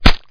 Bullet Hit Human; CastleMiner Z; Xbox 360

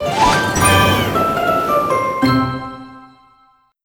Crunchy Finish

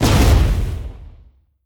destroy card; Animation Throwdown

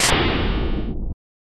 bang

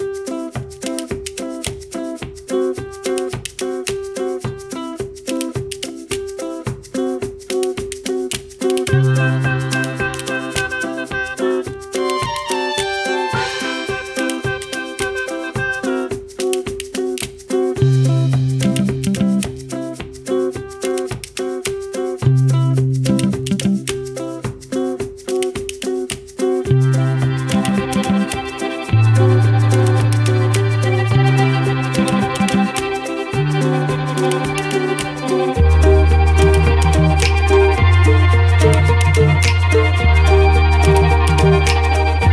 Fishing Village Exterior; burly men at sea ios macos ps vita ps4 switch windows gamerip 2016

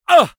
Male Fight Damage; mighty party 2017 gamerip pc ios android

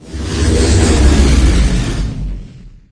Vehicles Generic

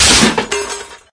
Vehicles Generic

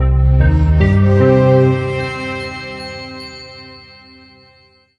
Stingers sting gravity

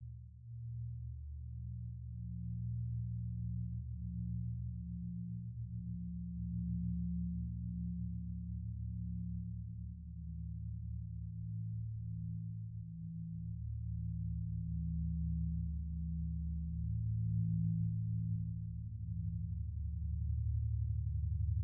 Wake Up Music; bramble the mountain king; ps4 ps5 switch windows xbox one xbox series xs

